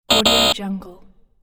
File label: wrong buzzer